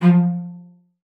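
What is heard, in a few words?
CELLO spiccato F3; Xsample VOL-5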